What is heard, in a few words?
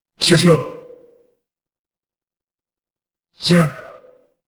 fire electric zap